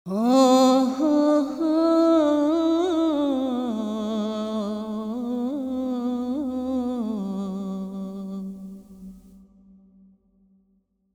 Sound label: VoP Berlin Swimming Hall 6 4 Sec 100 Decay